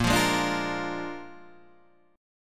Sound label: A sharp 7th A sharp, Guitar